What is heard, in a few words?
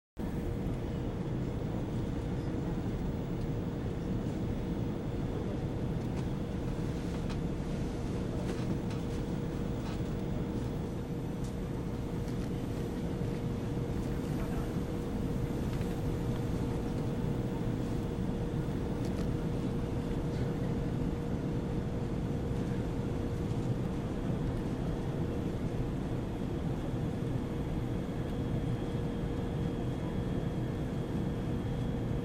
Interior Passanger Plane Modern